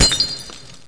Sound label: hit glass; SAS: Zombie Assault 4